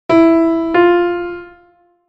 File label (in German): Intervalle sukzessiv